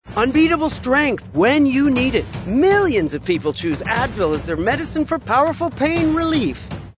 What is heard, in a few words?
drums